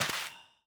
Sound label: break, soulsand; The Monster Plane